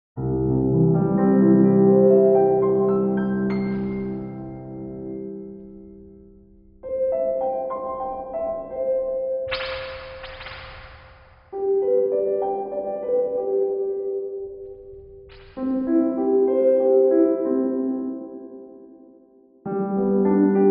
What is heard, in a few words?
Twangstroem Piano 3 Springs Shake LFO